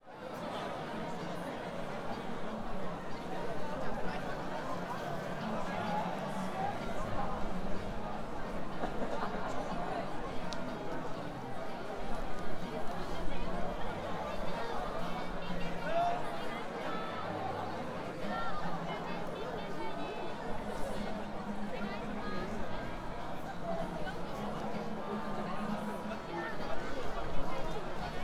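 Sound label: entrance ceremony, Fukushima University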